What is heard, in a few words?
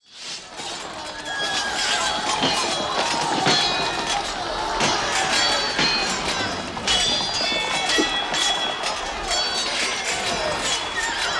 Sengoku Loop; Yu-Gi-Oh! Master Duel